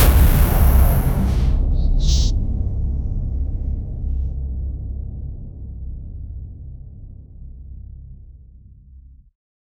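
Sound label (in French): impact d'énergie ténébreuse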